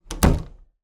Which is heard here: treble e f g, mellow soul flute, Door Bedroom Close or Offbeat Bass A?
Door Bedroom Close